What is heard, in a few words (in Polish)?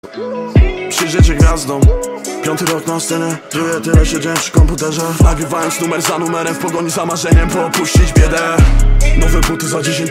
dzwoni telefonik